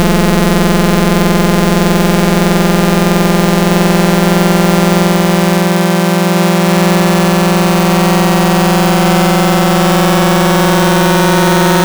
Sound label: Saw FM, Wavetables; Cymatics; Nightmares Vol 2 Wavetables; Serum